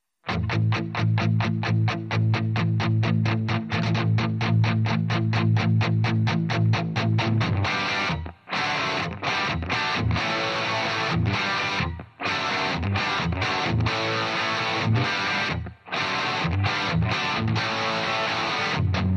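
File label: rock solid guitar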